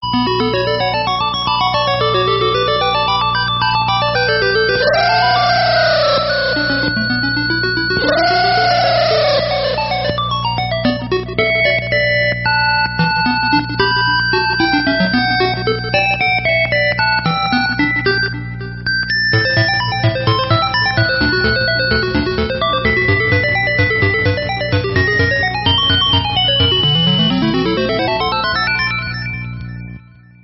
ballad ending

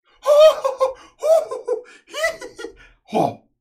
hohoho huhuhu hihihi hooooo

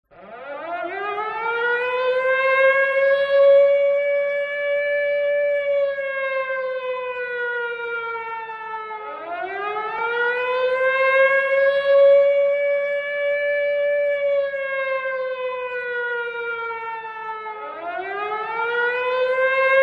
prison alarm

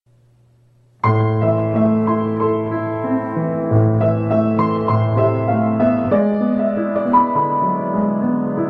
dun dun dun duuun

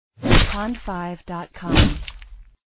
hammer hit body

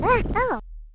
wahoh

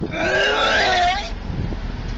puke man

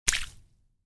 wet splat

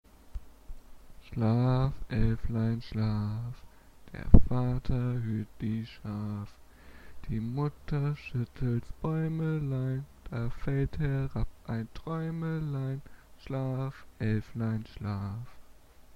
lullaby

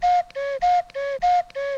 cuckoo cuckoo

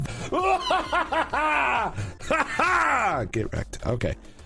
bacon evil laugh

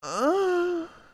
ahh

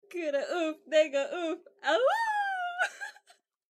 awooo

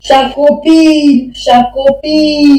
pigeon jacobin

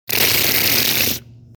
machine gun tongue

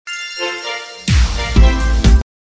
you win dun dun dun dun

